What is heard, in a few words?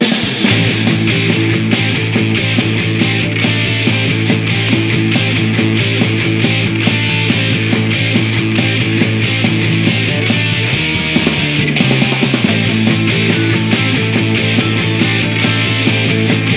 BGM Card Deck; Shin Sangokumusou DS Fighter's Battle; Dynasty Warriors